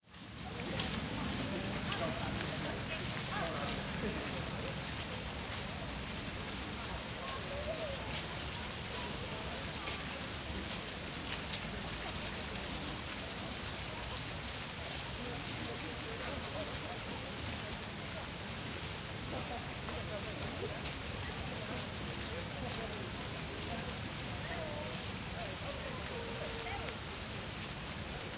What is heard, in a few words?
Crowd Park Ambience Light; Lynne Music